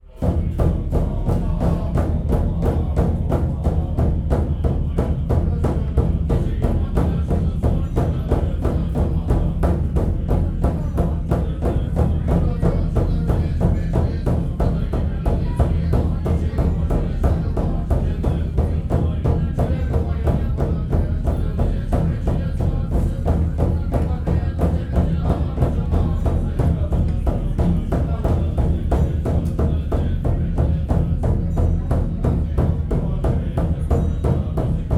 Monks Mantra

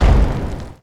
Explosion

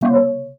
Sci Fi Short Error

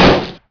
Soft Explosion

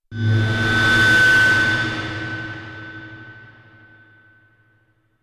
Feedback Smelter

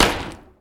Table Smash

